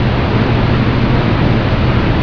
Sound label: subway; Effetti sonori